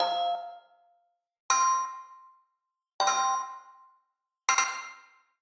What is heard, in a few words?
Digital Click Neutral